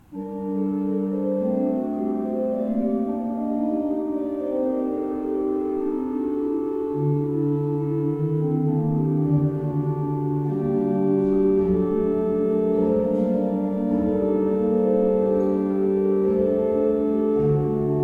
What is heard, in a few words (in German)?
Beckerath, gebrauchtorgel